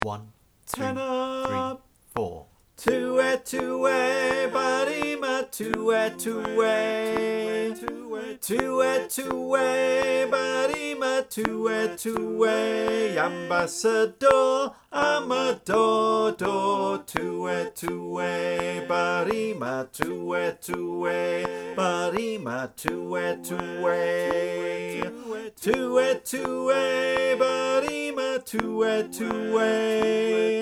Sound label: Main section to end - ten click